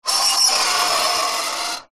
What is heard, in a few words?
foley metal sharp scrape